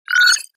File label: Digital futuristic confirm